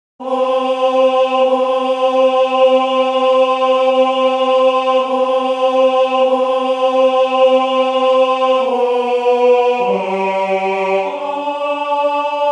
alto; Tibie Paiom

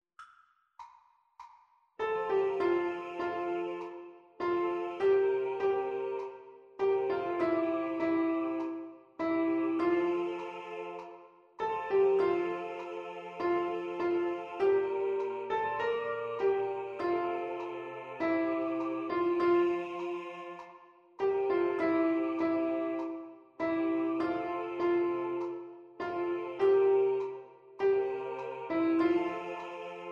Alti Piano

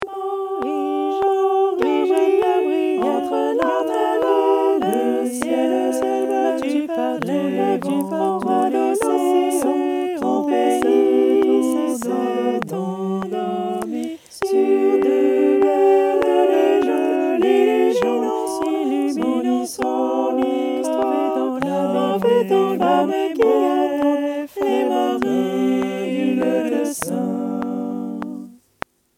Tutti

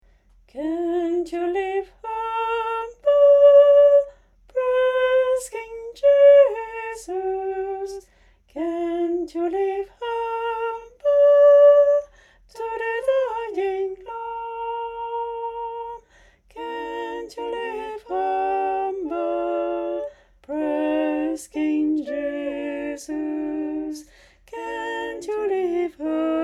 Tenor Basses; Can't you live humble?

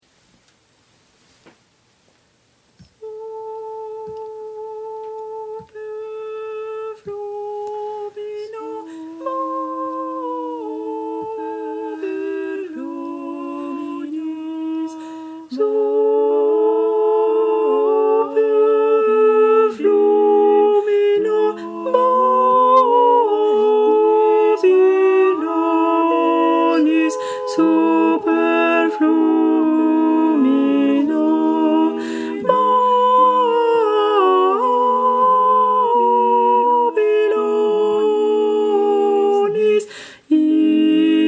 super flumina sopranes; Super Flumina Babylonis

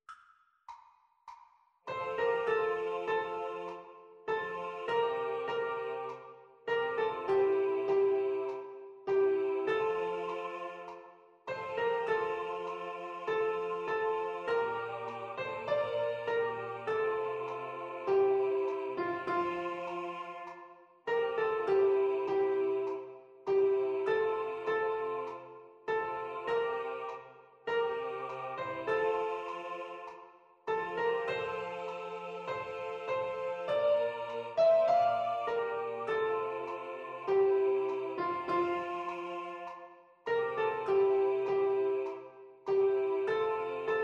Soprano Piano

